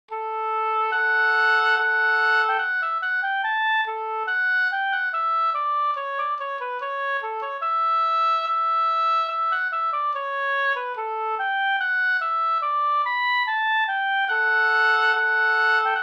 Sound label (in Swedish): brudmarsch; av Sven Forsberg